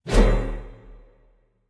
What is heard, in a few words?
swing; smite; baron samedi